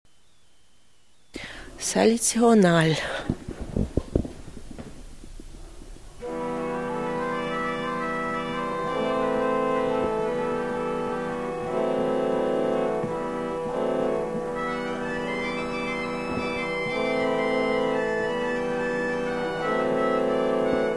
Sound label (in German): Salcional, Pfeifen; Toene